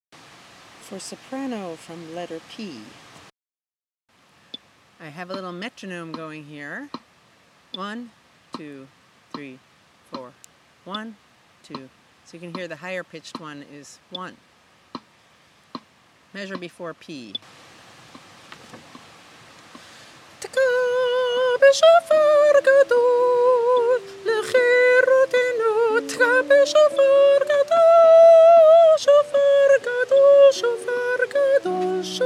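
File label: Great Shofar soprano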